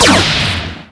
gun turret fire; darwinia demo

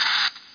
mach hit; PC Gamer IT CD 41

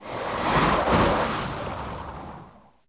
Abyss Open Program

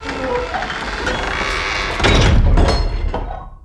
door close; DAoC

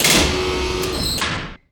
Quit Sound

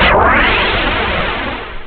Spell Launch; Realmz 4.2.2

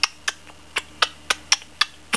kisses

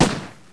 RIFLE; CLOSE COMBAT

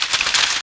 gobli

